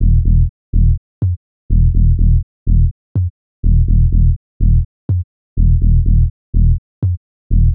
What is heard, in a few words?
Bass Loop D 124BPM